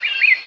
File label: bird random; CS Level2